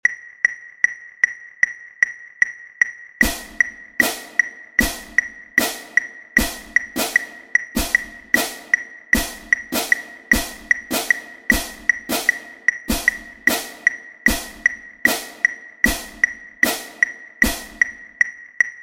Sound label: cymbals